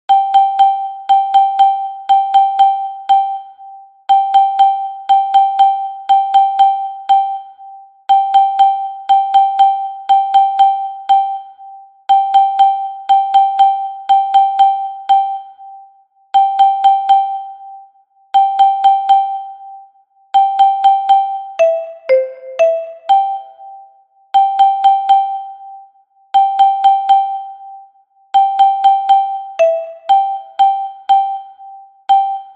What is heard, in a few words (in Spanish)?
Xilofono Soprano